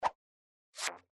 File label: Double Down indicator split, blackjack